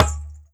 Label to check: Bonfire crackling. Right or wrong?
Wrong